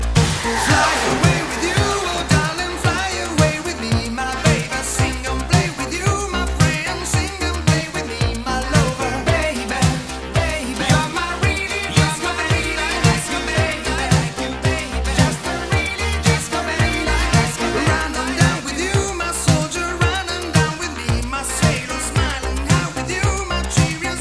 Disco Band

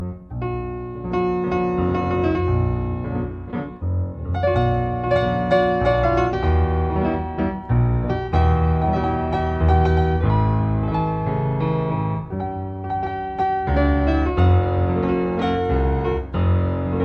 Malaguena Piano

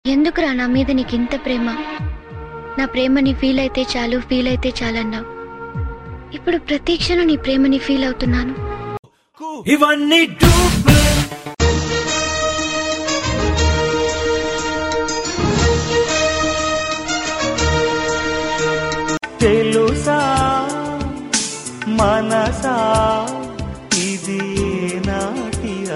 Telugu Remix

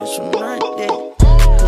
Boop Boop Boop

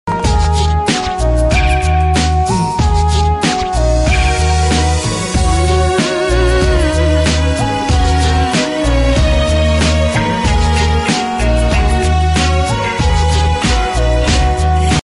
Instumantl Music

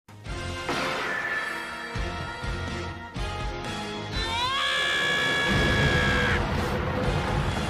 yelling; Gohan

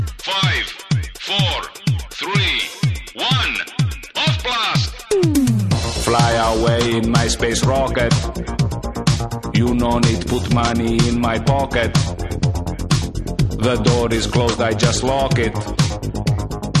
Elektronic

